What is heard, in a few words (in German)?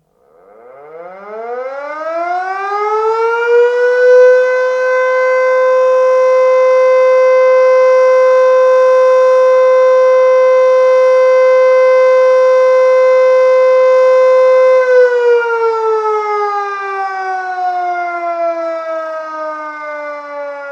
Signalton Sirene A Probe